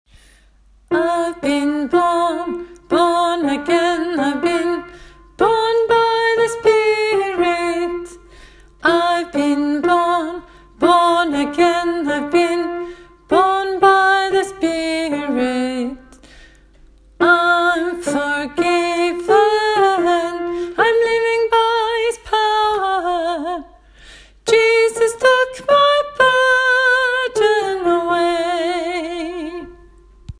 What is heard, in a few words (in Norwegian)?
born again sopran; åfjord